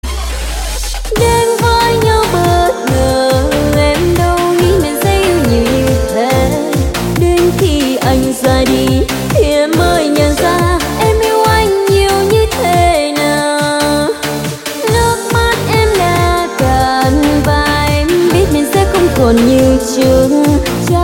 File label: Remix Edm Version